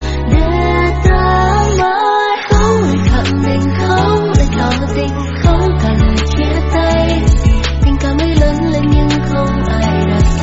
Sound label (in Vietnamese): Nhạc Chuông Không Lời